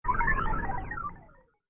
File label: Fast Droid Flyby; Robot Sound Effects; Whoosh Sound Effect